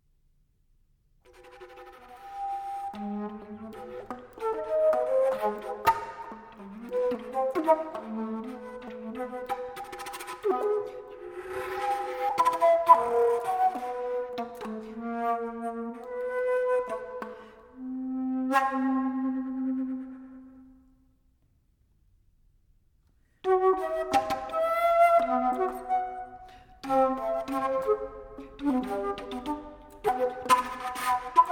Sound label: Solo Alto Flute